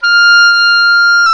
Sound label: OBOE, mf f; Roland L-CDX-03 Disk 1; Oboe 2&3